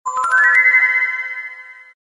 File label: flourish high magical bell